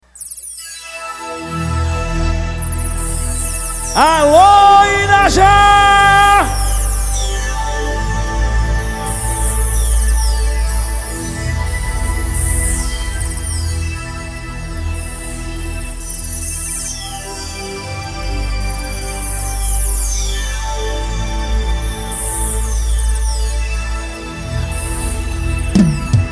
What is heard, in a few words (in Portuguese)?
paredão sertanejo; Inajá